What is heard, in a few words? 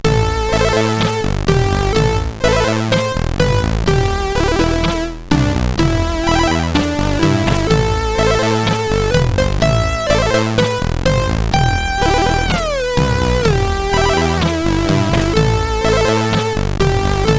Short Tune sid CSG8580R5